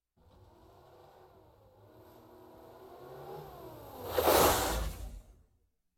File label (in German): auto kommt näher und fährt vorbei; Auto-Zweiraeder